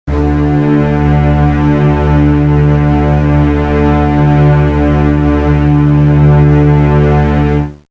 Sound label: bass D; tuneguide